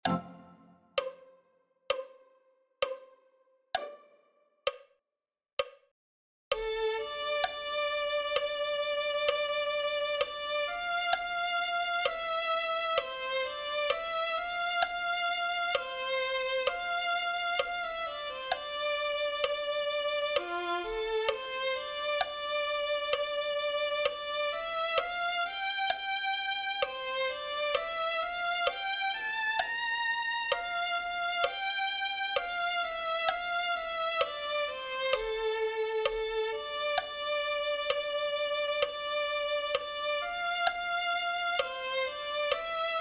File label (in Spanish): sion dara a luz violin